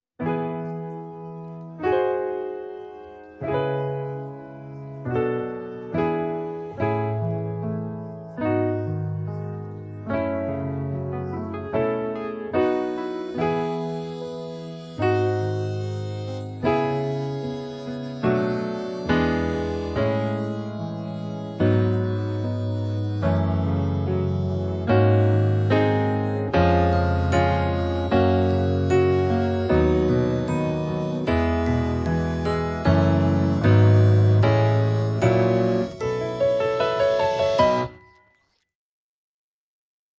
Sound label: karaoke; Hazbin Hotel